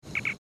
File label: communicator page; ENT